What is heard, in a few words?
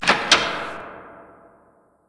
open